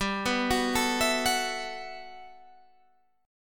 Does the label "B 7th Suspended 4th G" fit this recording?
Yes